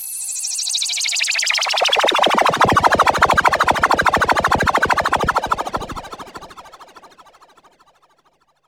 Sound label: FLYBY, SYN. FLYBYS; Best Service Alpha Dance vol.2